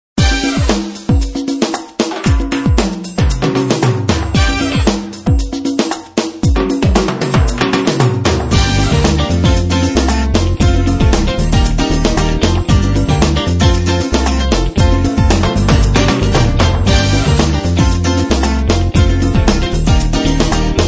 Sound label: karaoke version